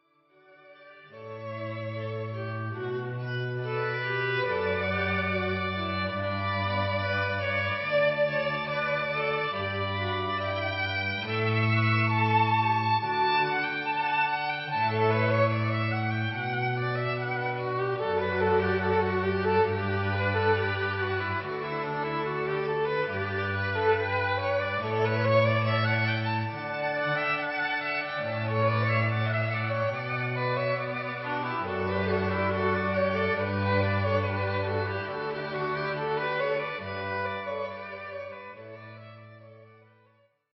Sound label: quartet pachelbel canon